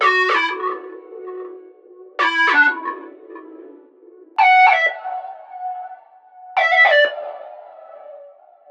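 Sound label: synth loop burn a flute 110 B minor; Virtual Riot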